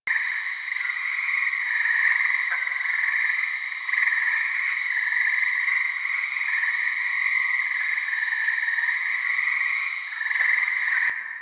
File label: night frogs loop; Ambience; Props; Loops; Warframe; Eidolon Plains